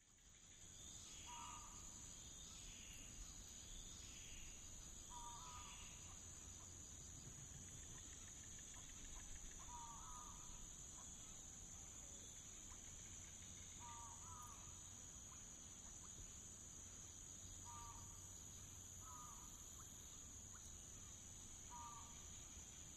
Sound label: Jungle Ambience; backgrounds